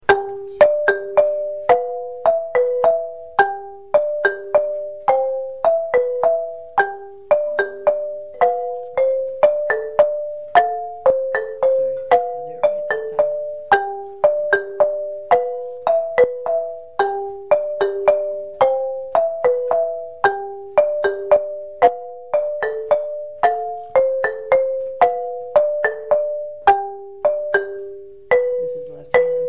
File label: lead funky bass, marimba; kare mugomba